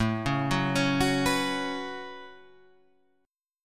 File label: A 6th Add 9th A, guitar chord; A6add9 Chord {5 4 4 6 7 7} arpeggiated